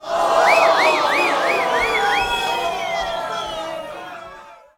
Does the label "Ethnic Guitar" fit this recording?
No